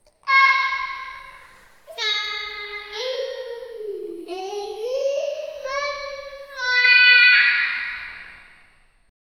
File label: sound effect a 6 year old